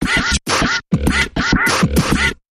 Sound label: Mechanical Percussion Variation Short Version